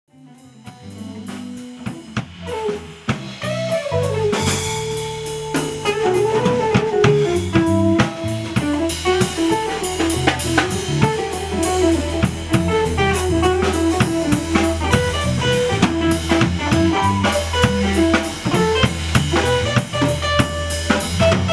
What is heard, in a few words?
jazzy impro